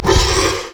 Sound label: worm basic attack; LoL; monsters